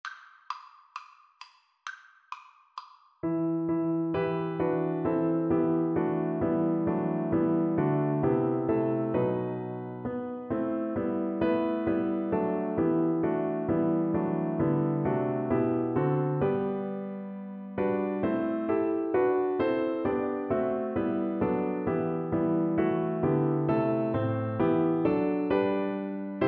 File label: god rest, Tuba